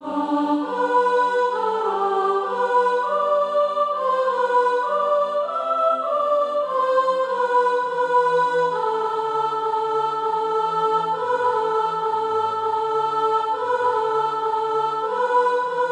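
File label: soprano, Practice Music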